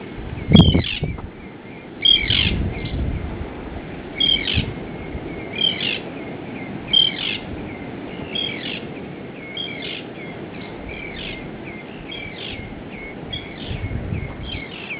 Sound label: arctic tern